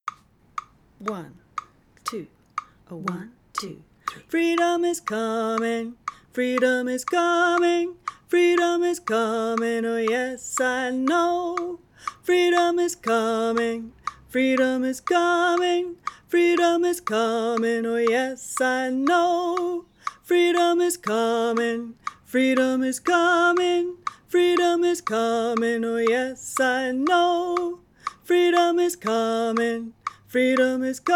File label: bass with click